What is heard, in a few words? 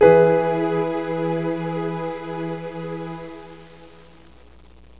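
piano strings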